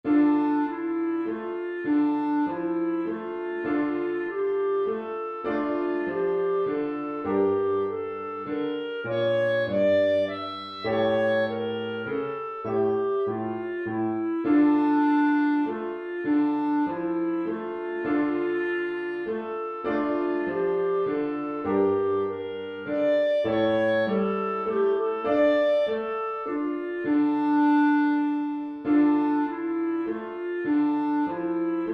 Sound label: scale study in a minor key; lewis alastair